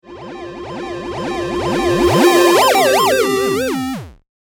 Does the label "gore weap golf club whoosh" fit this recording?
No